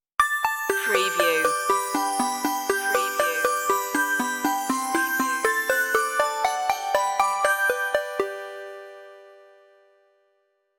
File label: merry christmas synthified stinger intro